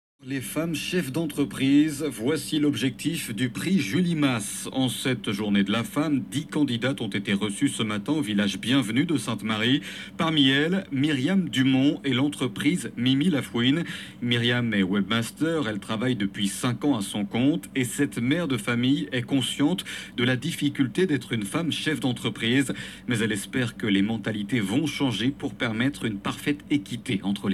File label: Vocal